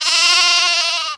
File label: Sheep Action